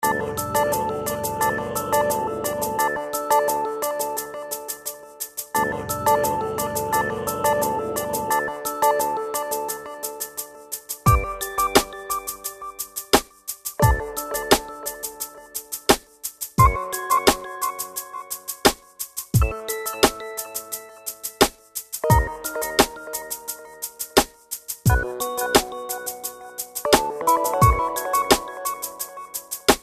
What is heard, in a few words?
Elevator Concept; Inner Demons